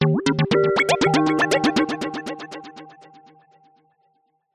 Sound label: multimedia logo musical short warm delayed science tech; zapsplat